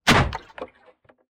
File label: Bulk Enemy Break